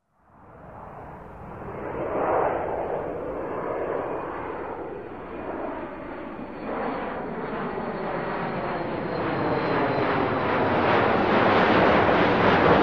vzlet passazhirskogo samoleta

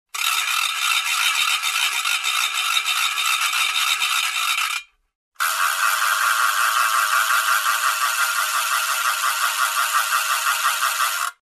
detskaja igrushka treschotka

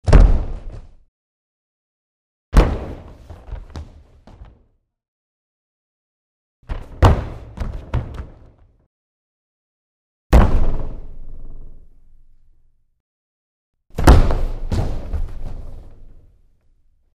padenie na ring

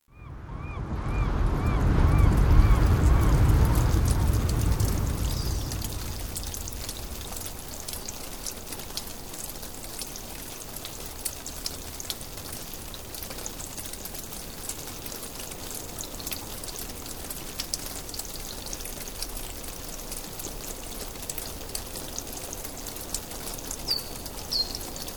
prirody; 3d

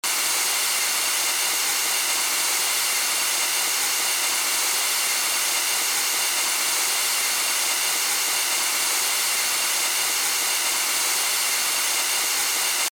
shipenie para

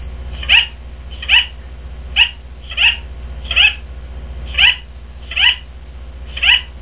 popug

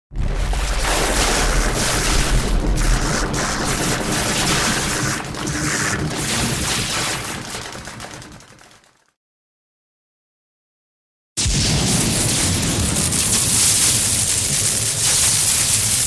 tresk vysokogo naprjazhenija

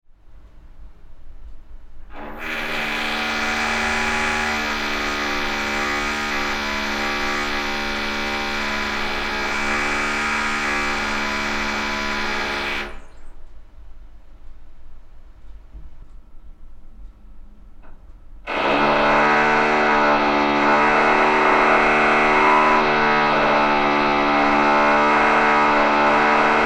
Zvuk dreli

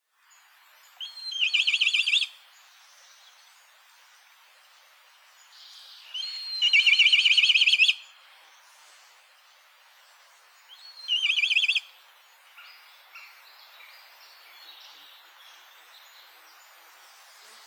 kriki korshuna

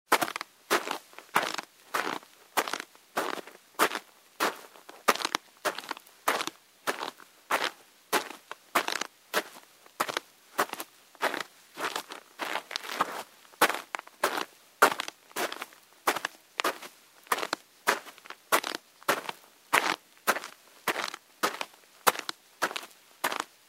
zvuk hodby po snegu

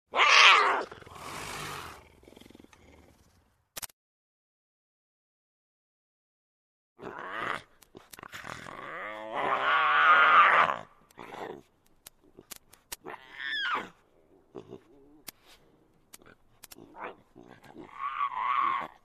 kotjata jaguara igrajut

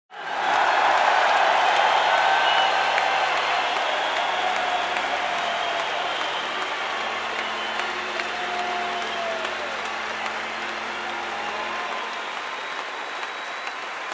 zvuk ovacii na tribunah

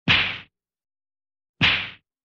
udary karate

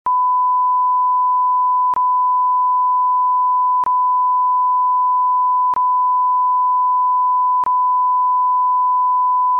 zvuk pi

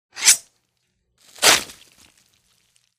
udar boevym toporom

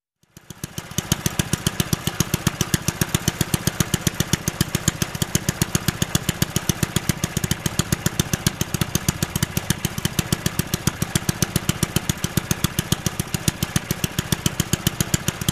zvuk gazonokosilki

